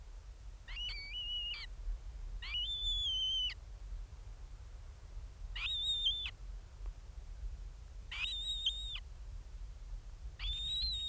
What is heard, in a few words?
skua sound